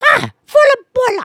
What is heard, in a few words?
sway, emotes